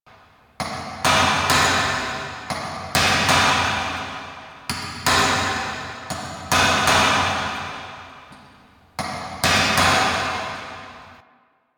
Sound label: Cage Loop; Entrati Labs